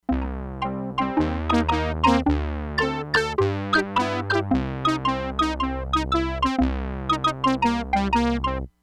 XYLO BRASS, Crumar Bit 99; Factory Programs

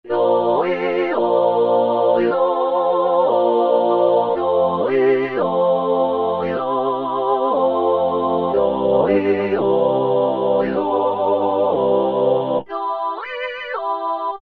Voc, E-mu Morpheus; OzBoiz

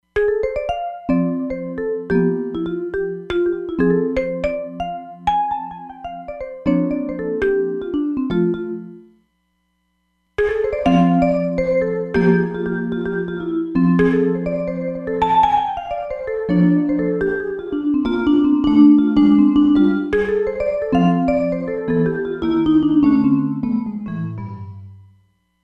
Chorused Non linear room, Roland DEP-5; Factory Patches

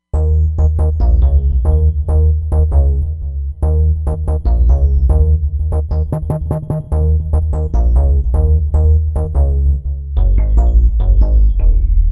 Base BoX, Roland Fantom XR

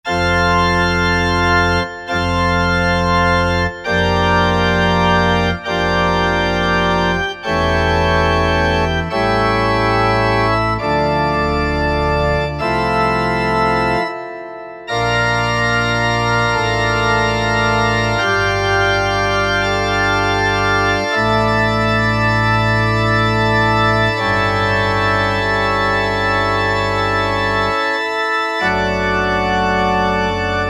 Pipe Full

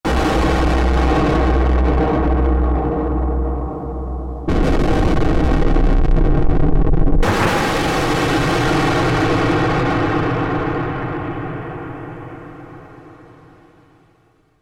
Explosion, Korg MSC-8S Percussion

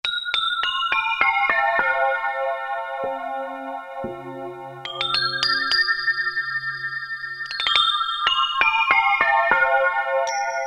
Thick Bell, Roland U-110; Factory Patch

